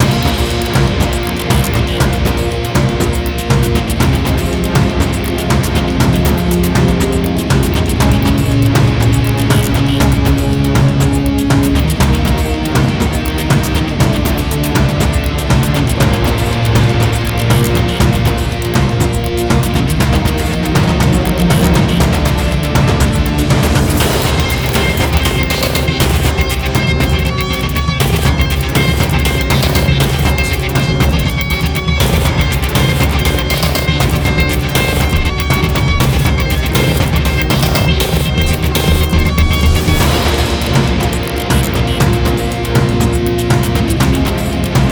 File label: Musik Forest Fight